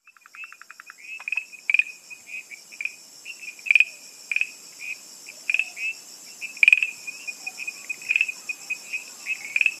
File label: Rhacophorus arvalis, tree frog of Taiwan; 諸羅樹蛙